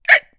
Pig Squeal; Angry Birds Space

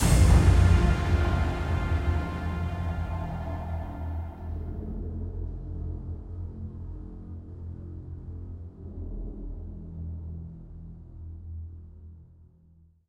Spotted Killed Stinger; Macau Fort Yudayong

